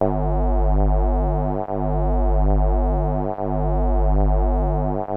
WASP; Masterbits; Vintage Voltage; SEQ-FX

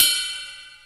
Ride, Boss DR-550, Drum Machines

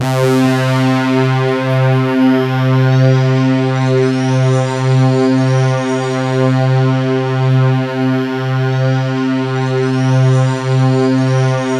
C3, SynthLine; 90 s Sample CDs; NiceBeats; SynthLine VOL-11

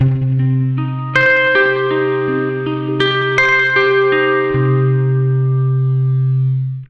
80 MIN ARP C, Acoustic Guitar Loops; USB Soundscan vol.04 - Electric & Acoustic Guitar Loops; AKAI

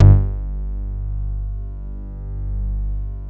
BASS G1; Best Service Dream Experience; R100M BASS

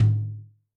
Lite Lo, Tom, Yamaha DTXpress-II

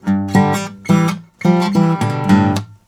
Blues Guitar; ILIO; Fretworks; LICK

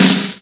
SD, Drum & Bass, 200 BPM; Ueberschall; Drum & Bass Resonance

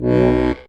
STEIRI B 0, STEIRISCHE

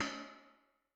ride, Cymbal; Yamaha DTXpress-II

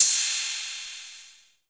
ANLG CYMBAL; Greytsounds VOL-1; CLASSMACHINE

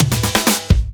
Fills 128BPM, Clubsounds

